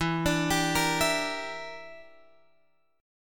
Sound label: E Minor 7th Flat 5th E, Guitar Open F; Em7b5; arpeggiated